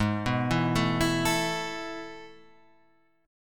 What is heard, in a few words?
A Major 7th Suspended 2nd